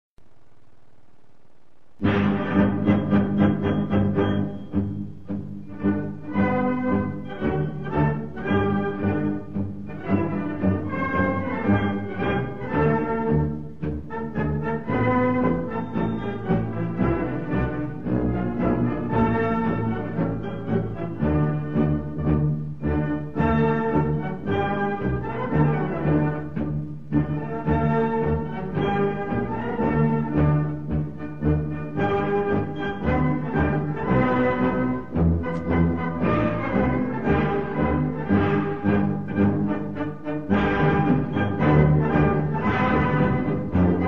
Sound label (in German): instrumental Preussenlied; Masovia